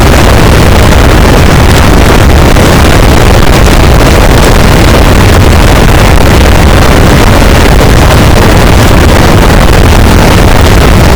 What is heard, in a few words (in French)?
cachalot negatif, boat, Physeter Macrocephalus